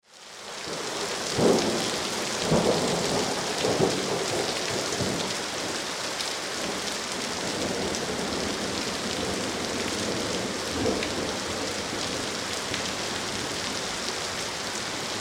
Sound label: Weather Rain Pour With Thunder Ambience